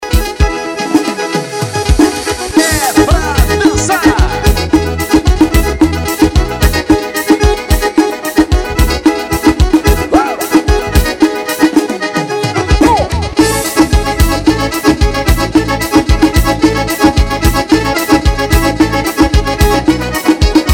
sanfonado, ao vivo

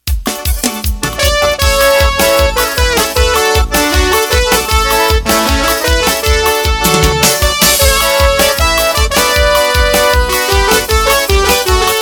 forro arrasta

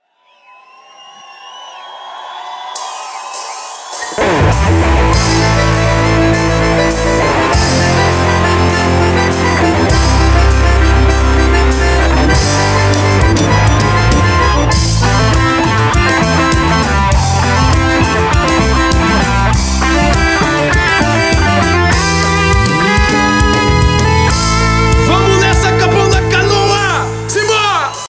vaneraco abertura

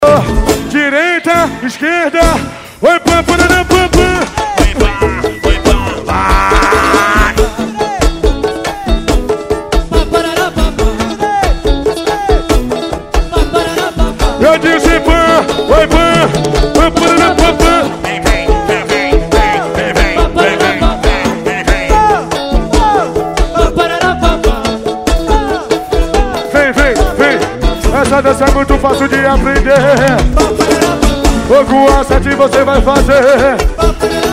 swing ao vivo

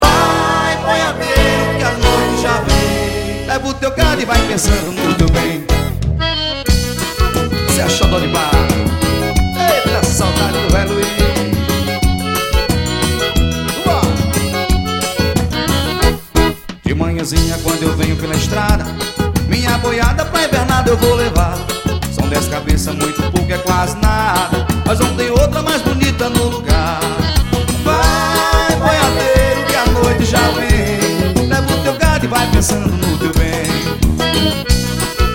forro

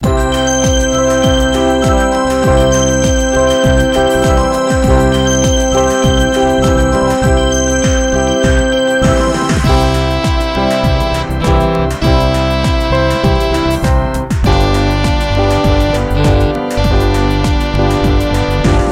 variacoes

